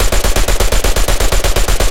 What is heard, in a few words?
loop m1919, guns tanks; War Thunder